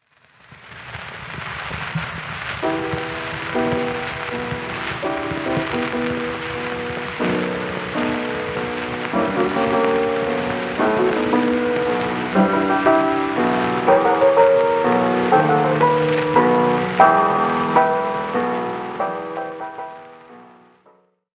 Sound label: Alla Menuetto Opening